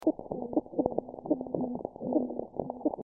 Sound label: Bagre marinus